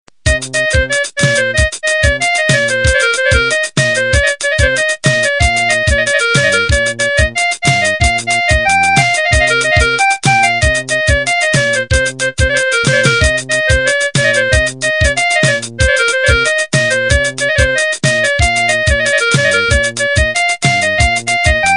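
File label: Arabic Tone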